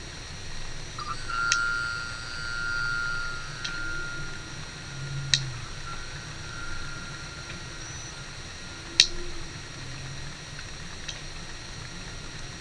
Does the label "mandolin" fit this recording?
No